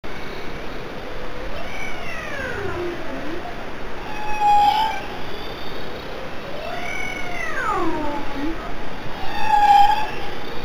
baleine

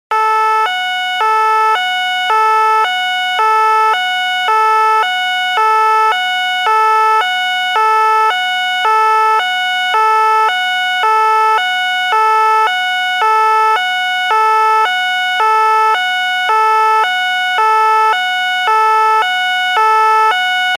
Sirene gendarmerie

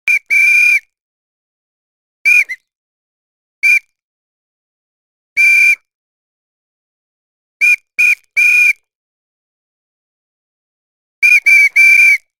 sifflet

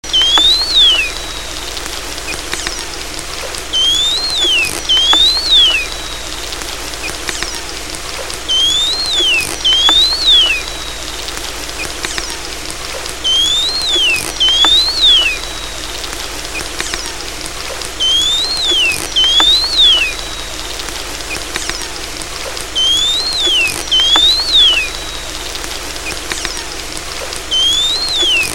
dauphin

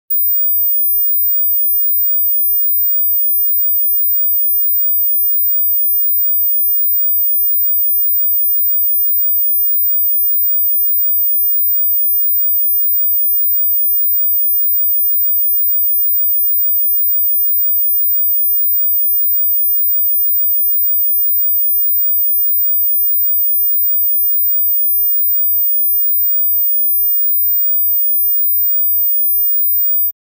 sinus 15000

